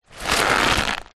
paper sheets lots scrunch up squeeze

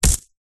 horror yeti monster footstep single heavy; zapsplat

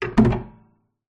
guitar steel string place onto stand

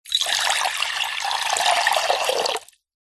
water pour into glass jug; food drink

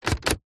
electronic typewriter switch slide; office; brother ax 450

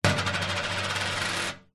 foley money coin set down spin metal table

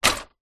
vehicles bicycle small childs drop down on others

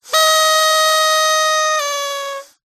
leisure party whistle squawker; zapsplat

